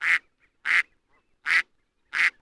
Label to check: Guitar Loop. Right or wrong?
Wrong